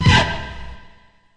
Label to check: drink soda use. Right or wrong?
Wrong